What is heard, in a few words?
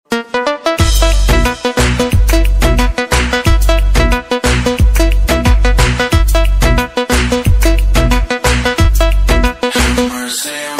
Ringtone Instrumental